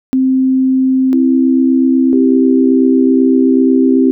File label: c major